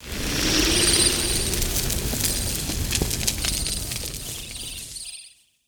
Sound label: Incandescent fireflies; Legend of Keepers